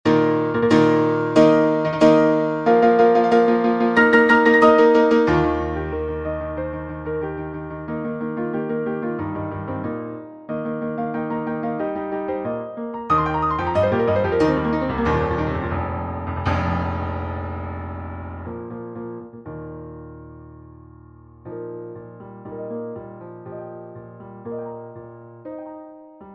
tremolos

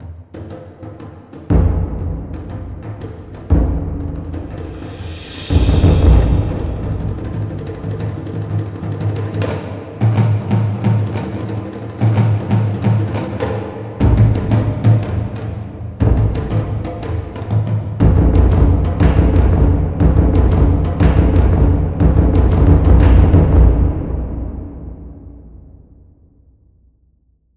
epic drums

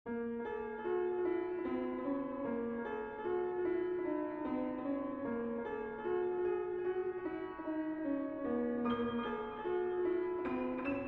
Microtonal Miniature in Bb

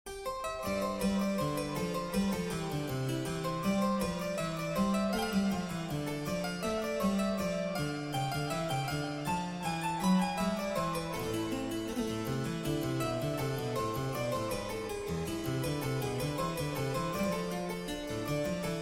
Microtonal Invention

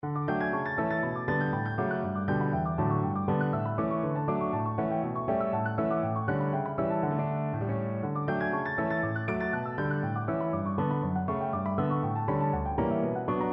Prelude in D major

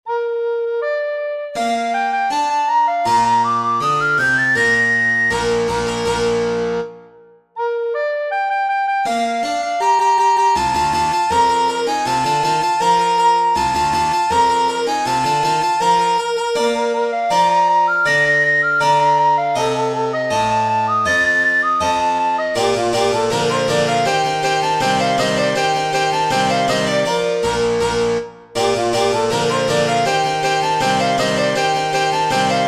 recorder sonata; 1 movement 1

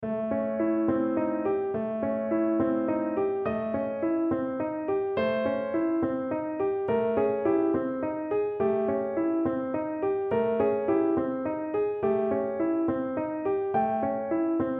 Madmans Lullaby

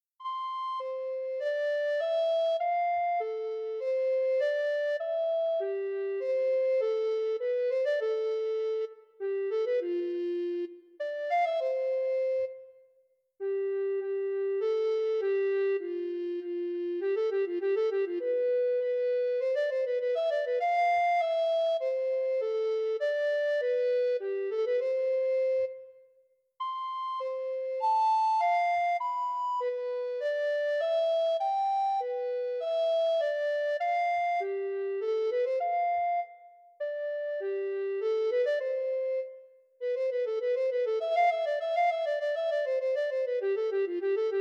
Soliloquy for Alto Recorder

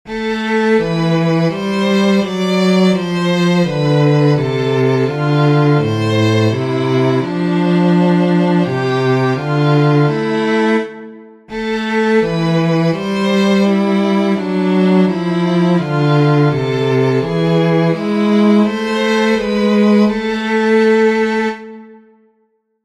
free counterpoint

